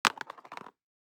DICE on Cardboard, Throw and Roll, Standard, Two Dice; Samples; ArticulatedSounds; DICE Sfx Party (Extended)